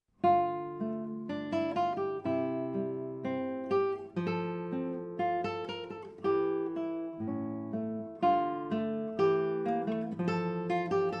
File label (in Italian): Studio intervalli; Studio 9 intervalli Marconi